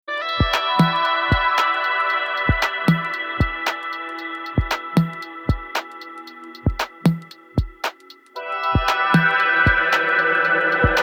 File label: Vintage Vibe Spring Groove